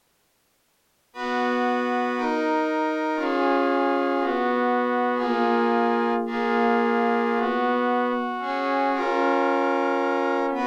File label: B Lydian